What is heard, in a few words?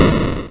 explosion